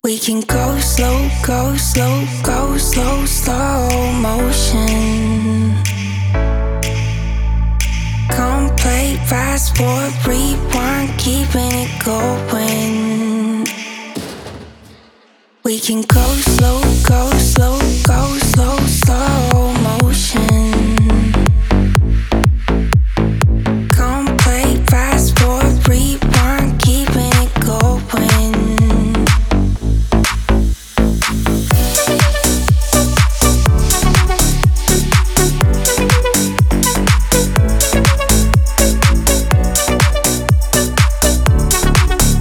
Ghost Slow

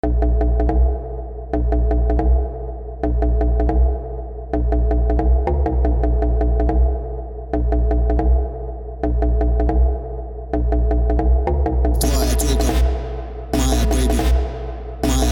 RAVE

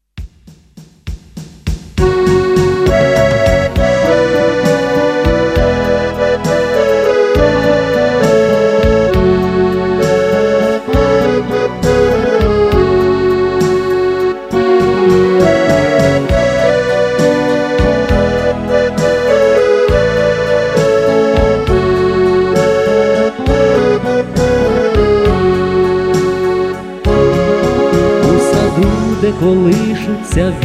narodnye pesni, Plakucha gitara; Ukrainskie narodnye pesni

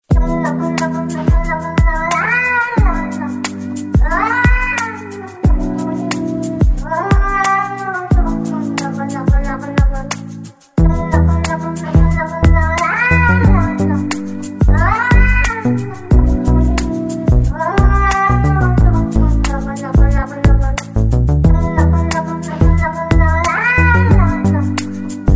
Soulful Singing Cat Live Looping Remix

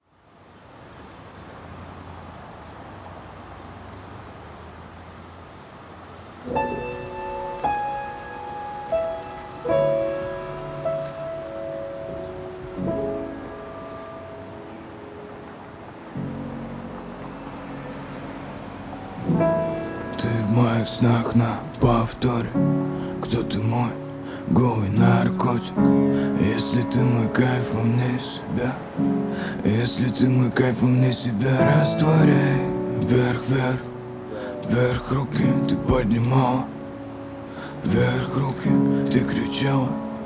Acoustic Version

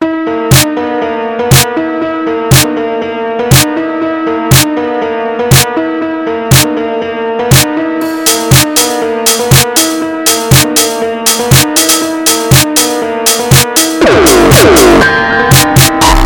Slowed

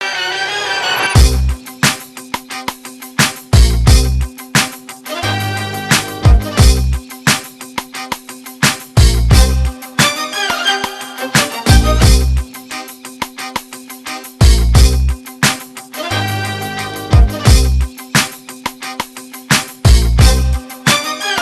Rap Remix Instmental

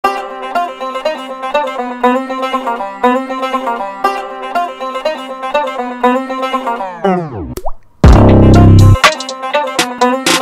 The Banjo Beat

